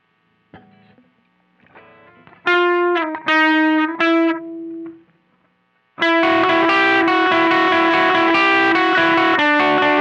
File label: Riff Slow